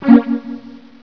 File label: Teleport; Bombzuka; Retro